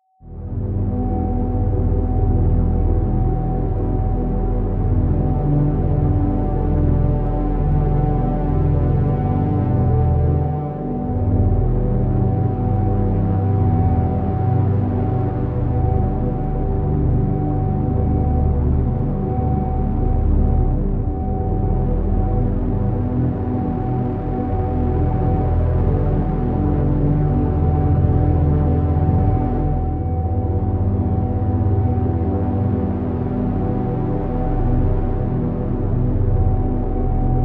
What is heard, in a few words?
Healing Tones 768 Hz